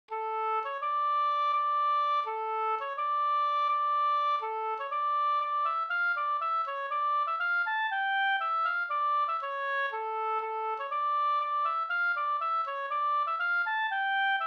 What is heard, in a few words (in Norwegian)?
pols; fr Nitenholm e Ivar Ludnes utl av Kalle Almlof